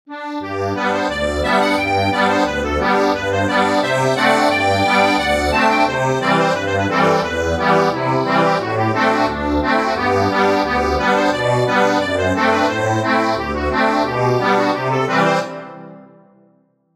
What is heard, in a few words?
accordion, sheet music